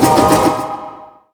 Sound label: scifi, SCIFI & SWEEPS; Techno Trance Essentials